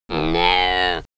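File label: Gazella subgutturosa juvenile mouth; Oral call of a juvenile goitred gazelle anticipating the feeding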